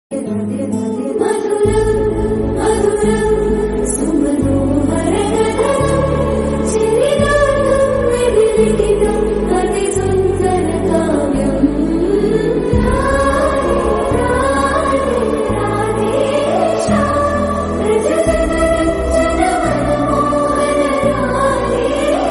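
climax background music song ringtone trending background music; radheshyam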